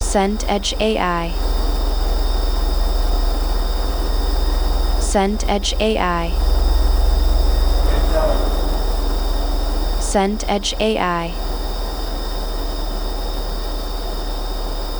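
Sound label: ambience airport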